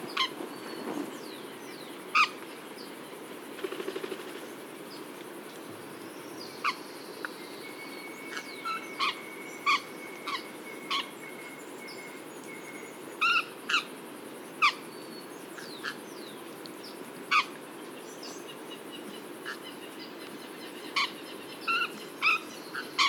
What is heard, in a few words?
Fuertess Parrot Hapalopsittaca fuertesi